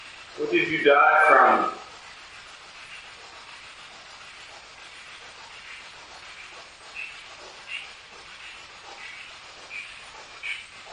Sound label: Bedroom Spirit Box